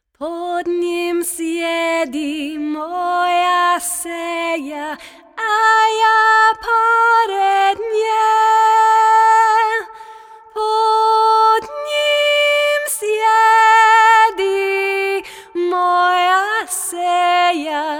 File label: Vocals Verse; Verse 3